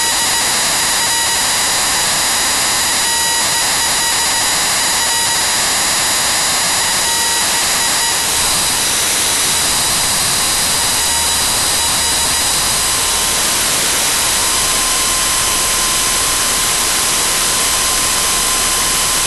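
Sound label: GPS audio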